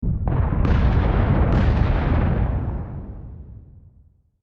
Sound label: Cannon Fire Distant; Warfare